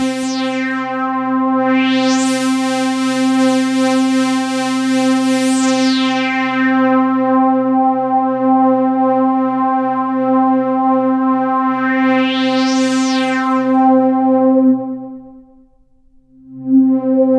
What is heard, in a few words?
C4, SynthLine; 90 s Sample CDs; NiceBeats; SynthLine VOL-1